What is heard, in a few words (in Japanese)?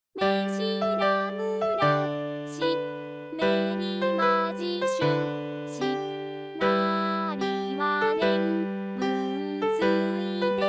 終止形 ピアノ